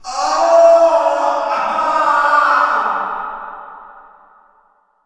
prison pain scream; Quake 4 Alpha; id software

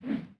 fat woosh miss; DoomRTX

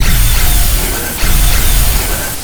walk; Quake 4 Alpha; id software; strogg; valkaryne

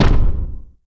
step; Quake 4 Alpha; strogg tik

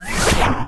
grav impact grabbed; Quake 4 Alpha; id software; weapons; gravity

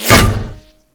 metal imp, ambience; Quake 4 Alpha; id software

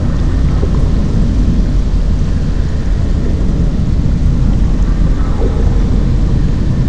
cryo bubble machine; Quake 4 Alpha

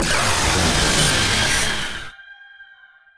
pain two, strogg; Quake 4 Alpha; id software; valkaryne

